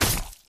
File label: Stab; Zomboid